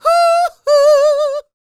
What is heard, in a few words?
D BIT, Vocal R&B Dance